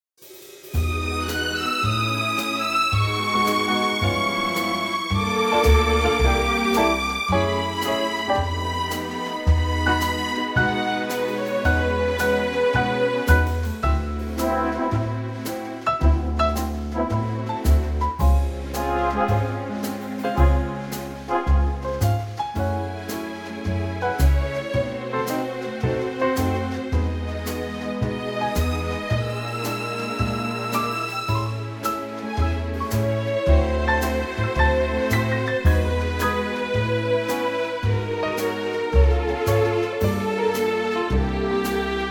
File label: E orchestra